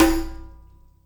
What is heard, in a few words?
Combo Shard, Processed Drumkits; Sonic Foundry (Sony Creative Software) - Processed Drumkits Zero Gravity Beats; Shards